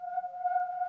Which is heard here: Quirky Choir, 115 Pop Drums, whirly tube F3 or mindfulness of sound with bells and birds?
whirly tube F3